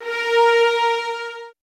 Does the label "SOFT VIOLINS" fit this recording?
Yes